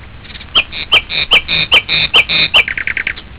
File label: Chirp Sound